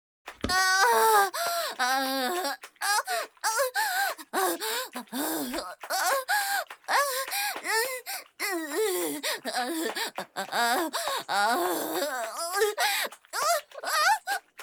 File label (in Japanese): 絶頂（SEあり）高潮